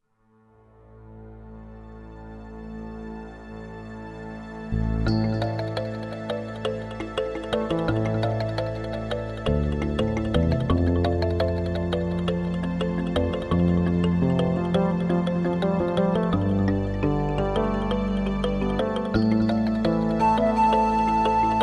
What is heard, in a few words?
Korg Pan Flute Sound Track